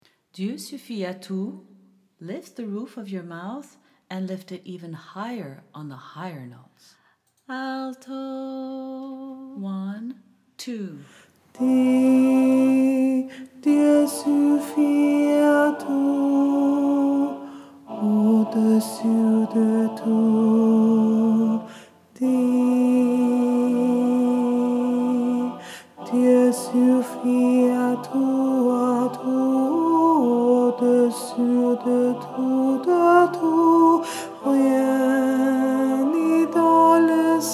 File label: alto, SATB, French